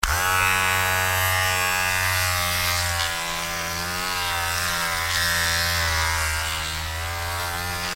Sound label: Electric Shaver